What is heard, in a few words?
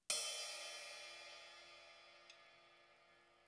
custon projection crash ping, cymbales